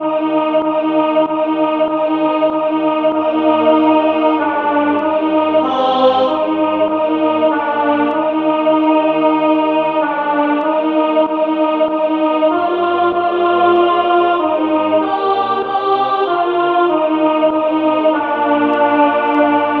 Alto, choir; The Church`s One Foundation

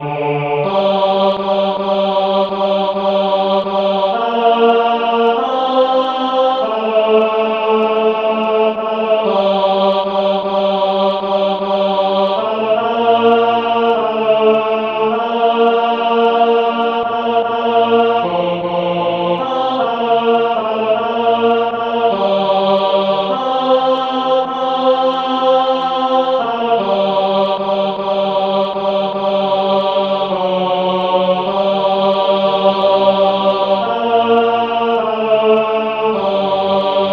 The Angel Gabriel Tenor, choir

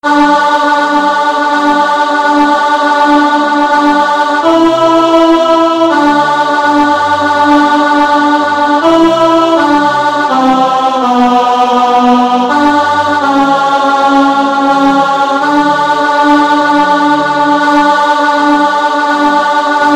Faith Of Our Fathers Alto; choir

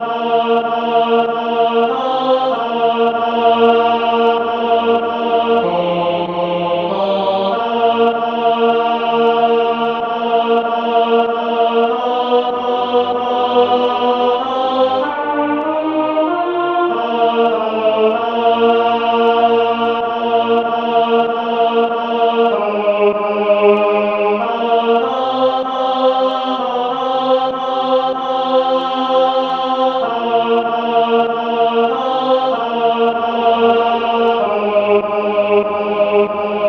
Tenor, choir; The Church`s One Foundation

